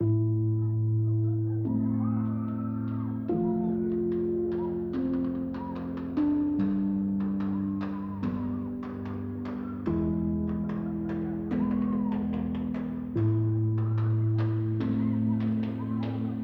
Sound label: Calming Piano, 8D Audio; 8d Effect